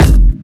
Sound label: clean kick f sharp key